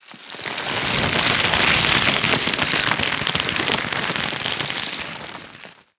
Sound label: tongue ext, barnacle; Half Life Alyx